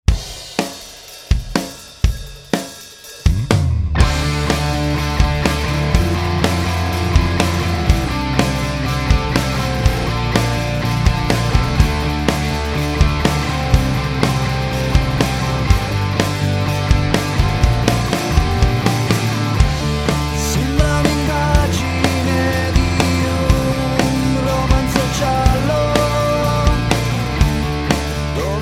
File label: rock